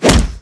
kick hit, melee

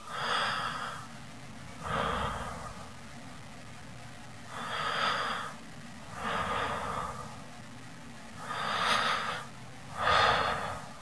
breathing slow

